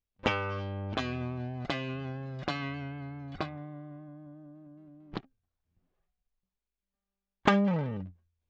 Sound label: Guit Solo Line, RNR for piano